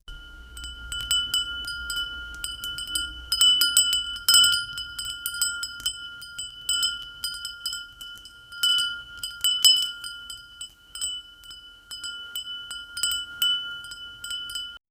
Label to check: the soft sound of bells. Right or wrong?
Right